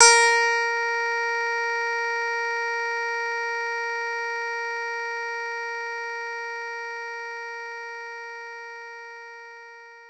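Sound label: Clean Guitar A sharp 4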